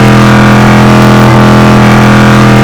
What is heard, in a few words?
challenger on mid